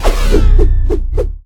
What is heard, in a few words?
Meelee attack